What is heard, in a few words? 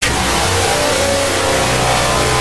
loud warning